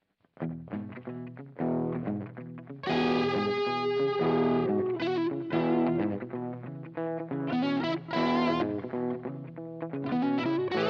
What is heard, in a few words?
quick rock n roll guitar jam; paul leishman a k a anjelicas baby